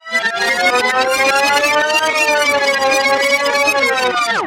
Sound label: Rewind